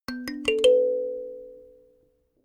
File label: notification kalimba sequence ascend